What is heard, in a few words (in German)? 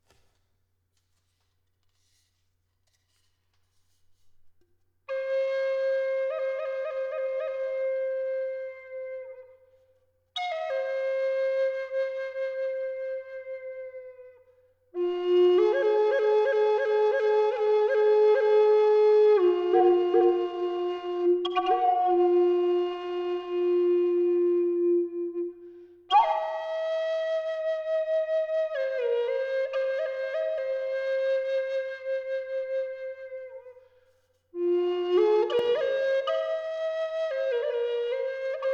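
Wacholder Fis 432 Hz; Bergkristall; Feder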